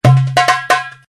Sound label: darbuka; Sony; Xperia Tipo